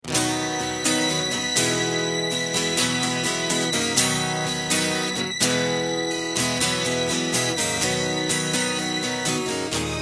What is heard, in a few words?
B No Backing Vocals, backing tracks